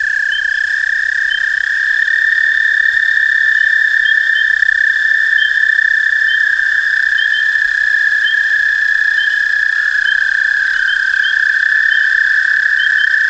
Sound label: call american toad; frogs